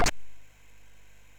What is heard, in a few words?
sid MOS6581R2; Blap N Bash Revisited